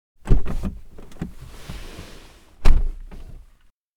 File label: Car interior perspective door opening and closing